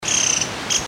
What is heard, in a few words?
White eared Sibia Alarm; Heterophasia auricularis; call (alarm); Bird Call Recordings